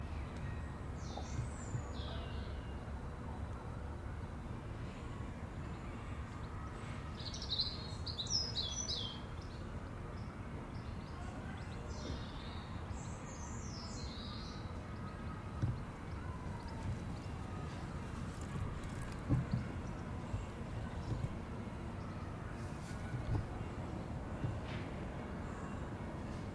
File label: occasional birdsong and a persistent bellringer in my garden on a friday afternoon